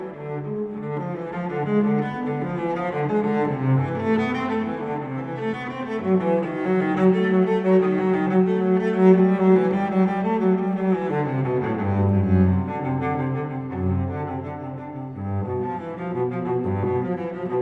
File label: Cello Suite No 1 in G Major Prelude